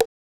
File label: Perc Plastic, One Shots, Drums, Misc Percussion